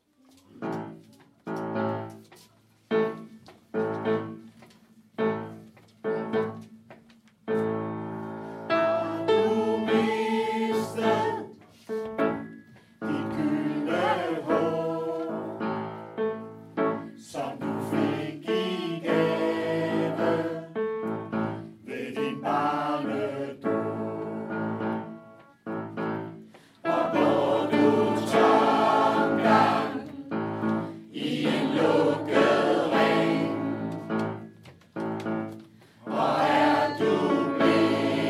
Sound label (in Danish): Sirenesangen